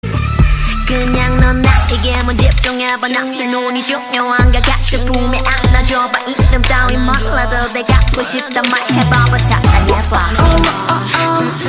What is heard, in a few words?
Rap KPop